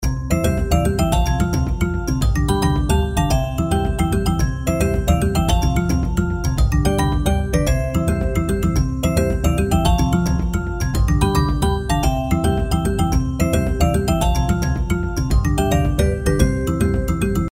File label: trex intro bgm; The King Of Dinosaurs